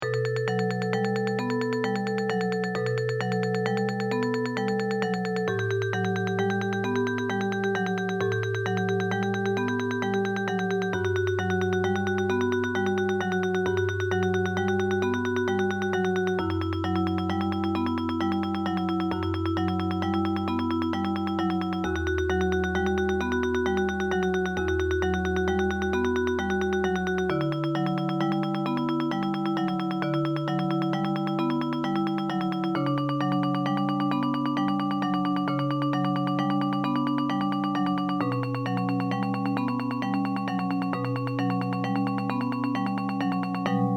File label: etude for a new tremolo technic